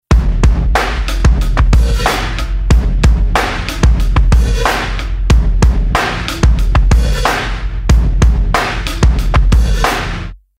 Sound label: 185 DRUM LOOP GROOVES MARCHING